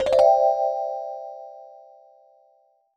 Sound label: Bubble appear; android sounds for iOS